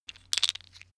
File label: tiles wood rattle; objekte; holz